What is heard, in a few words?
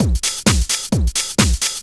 drum 130 trance